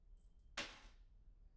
keys; IEEE AASP

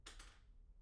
pendrop; IEEE AASP

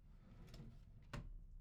drawer; IEEE AASP

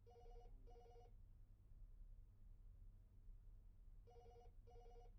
phone; IEEE AASP

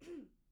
clear throat; IEEE AASP

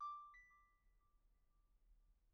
alert; IEEE AASP

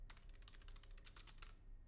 keyboard; IEEE AASP

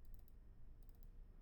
mouse; IEEE AASP

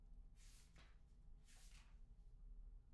pageturn; IEEE AASP

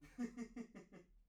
laughter; IEEE AASP

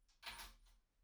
keys; IEEE AASP

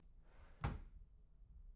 drawer; IEEE AASP

